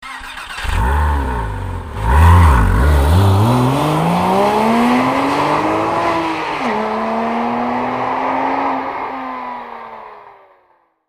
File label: boxster sound, Porsche, A bord; (1997)